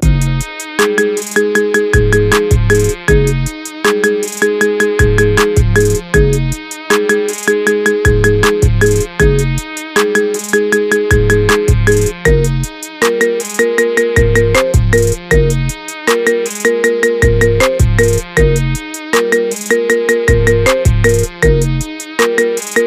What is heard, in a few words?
marimba, ringetone